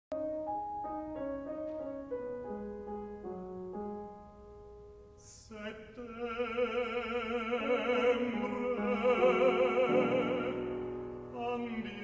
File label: me singing Animal Recital